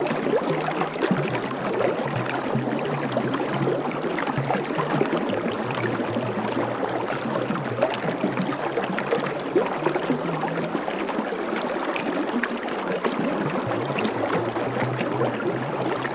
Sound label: Stream Gentle Babbli, streams